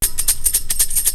SHAKER

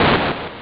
Stone; BoulderDaesh; ThomasRichter2; Classic800XLPro

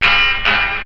BAD GTR; BEAT2000

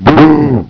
BOO

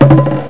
PLASTIC

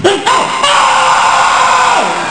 DIE

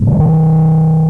SERVO; JOSEPHIN

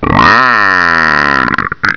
whirr, machines

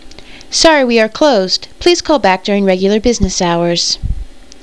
females; PEOPLE

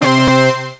BIG HIT

